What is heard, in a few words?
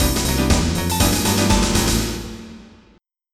FILL, HOUSE